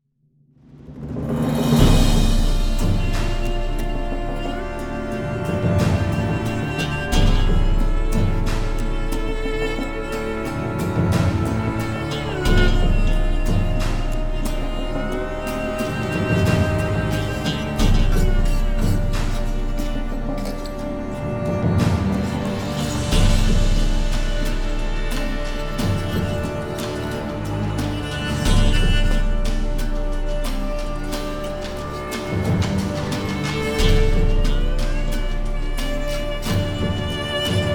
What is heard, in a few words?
Dramatic Loop; The Witcher 3 Wild Hunt